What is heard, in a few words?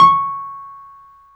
PNO, Wurly